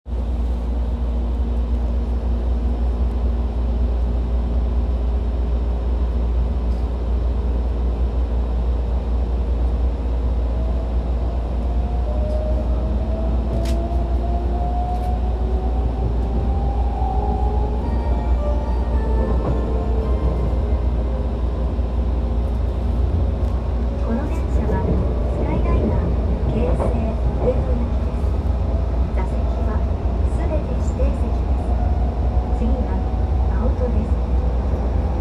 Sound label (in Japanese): 京成本線鎌ヶ谷西